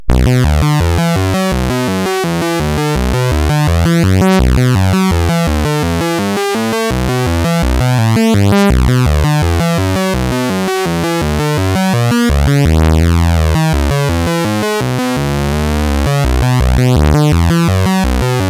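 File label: Puss n Boots, MOS 8580 SID chip music, games; CSG8580R5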